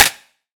claps, dance drum kit; 99Sounds x Monosounds; Twilight

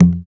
African Perc

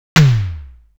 Tom, Drum One Shots; AMG - Coldcut's Kleptomania VOL-1

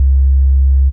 DM BAS, Dance Mega Synth

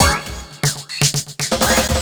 Fill, Drum N Bass Percussion Fills n Breaks

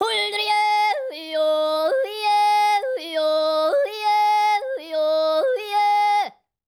YODEL, World Voices; ILIO; Vocal Planet

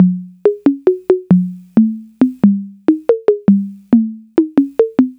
808 Congas

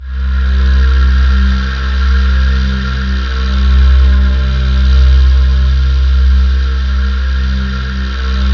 C2, Synth Leads

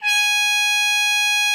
HARM G#5, TR MUTE; Xsample VOL-3

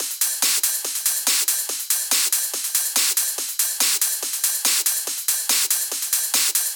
PWM Kit 142 BPM Loop, Drums & Perc; Vengeance - Essential Clubsounds Vol.5; VEC5 Demo Constr Kits; Root Gmin

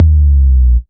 Bd, Kick Drums; Drum Hits; Rudeboy; Nu Dance Electro; Zero-G; Soundclash

